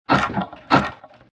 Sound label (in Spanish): Rasgar puerta